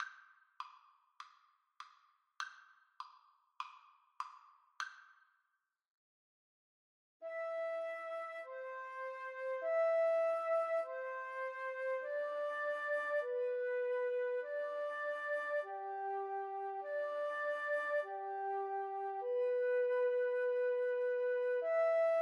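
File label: o sole mio, flutes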